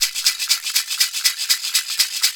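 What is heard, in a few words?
maraka groove; Best Service; World Colours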